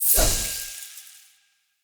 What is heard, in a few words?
Loot Drop Generic